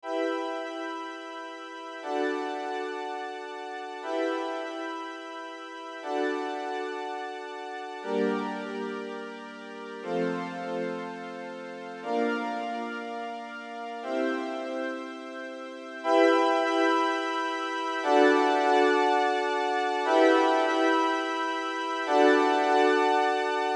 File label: pad wet chorus